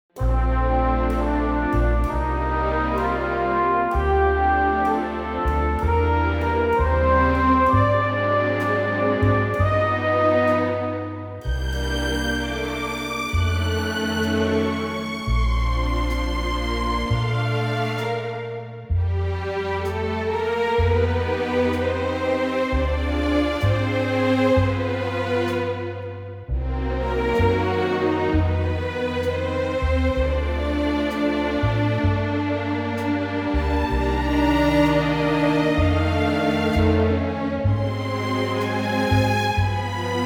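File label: orchestra Bb; key - Bb - vocal range - C to D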